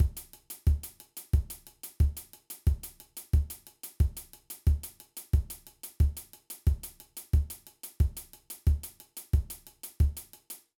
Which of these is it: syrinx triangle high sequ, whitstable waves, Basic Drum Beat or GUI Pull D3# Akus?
Basic Drum Beat